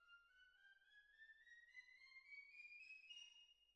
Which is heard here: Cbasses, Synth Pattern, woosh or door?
Cbasses